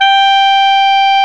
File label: Clarinets